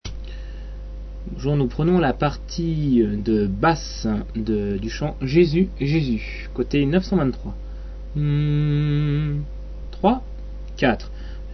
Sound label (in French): Jesus basses